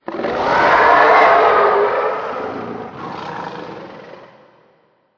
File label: Ring Creepy; Microsoft; Windows Mobile ringtones; Ring Tone Pack 6